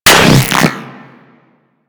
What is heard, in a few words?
Aim; Infested Tentacluster